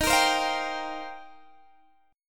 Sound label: Eb 6th Add 9th Eb, Guitar Open G; x,20,20,17,18,17; strummed